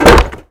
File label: Wood Hit